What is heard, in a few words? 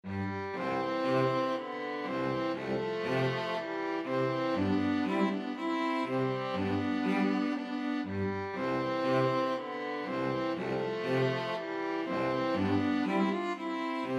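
brahms symphony 1, string trio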